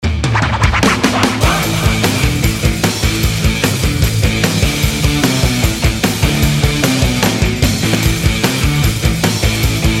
POP GENERIC

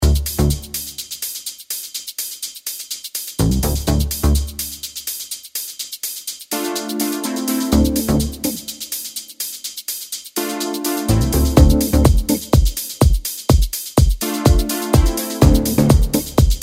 FUNK

